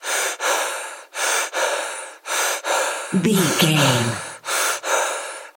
voice male breathing mask loop walk jog

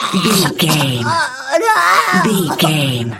voice female death intense

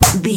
punch slap whack hit

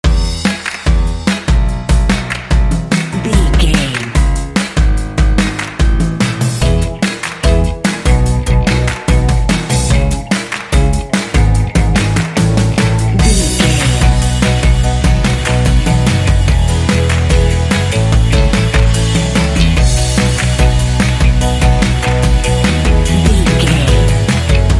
jingle catchy cutey

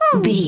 voice fun small character emote happy

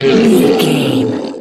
troll monster hurt pain long

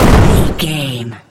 explosion large no tail

